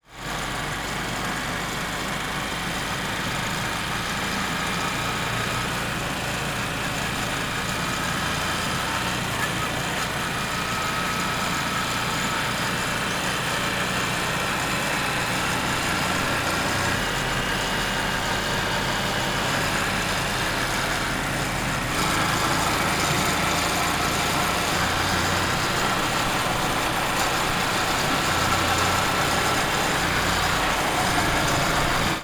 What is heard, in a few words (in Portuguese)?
Motor rocadeira vento; maquinas; colecao sonora do cerrado